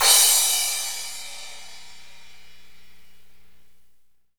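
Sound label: cymbal, Pop Country, Wet; Northstar; Drumscapes Roland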